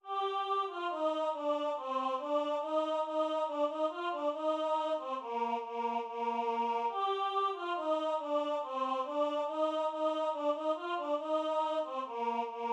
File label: Alto; Deck the Halls